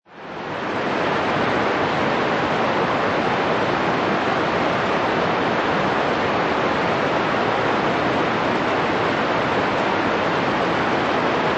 Rain Roof Interior Perspective, Rainfall heard from inside. Heavy rain falls on roof, interior pe; Rainfall heard from inside. Heavy rain falls on roof, interior perspective. Seamless loop.; Rain Sound Effects